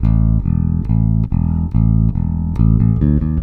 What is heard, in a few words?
Country Bass Verse2; Digidesign; Pro Tools Essential Loops